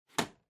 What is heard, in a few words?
Vacuum Cleaner Lid Close; 掃除機; ふた 閉じる